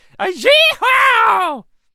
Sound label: Lasso Attack Vocals